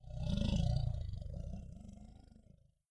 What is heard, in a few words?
grunt idle; fenrir